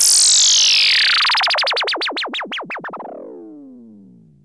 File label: FALL, SYNTH FX; AMG - Global Trance Mission VOL-2